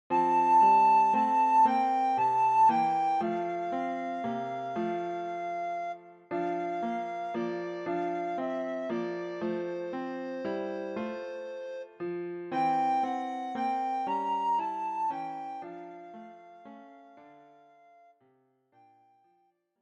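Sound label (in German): Leise rieselt der Schnee, für Sopranblockflöte Klavier; deutsches Weihnachtslied für Sopranblockflöte und Klavier; Leise rieselt der Schnee - für Blockflöte mit Begleitung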